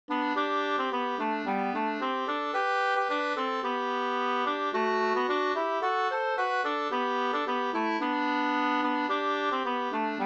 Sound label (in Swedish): ganglat